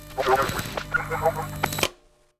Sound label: Cuts Radio; Felix